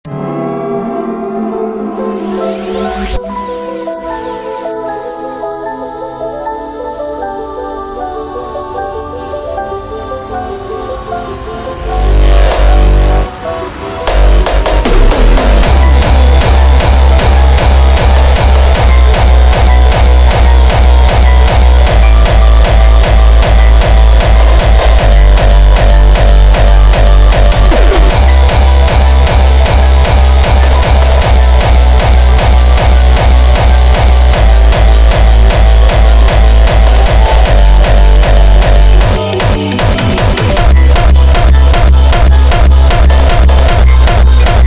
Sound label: Hardstyle